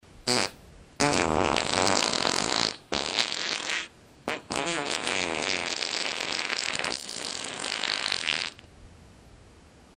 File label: wet slimy fart